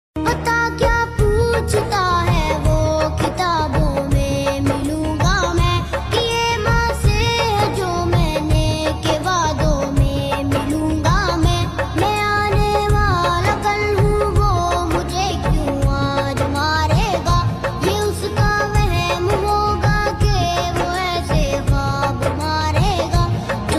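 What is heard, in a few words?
naat sharif ringtone, children's naat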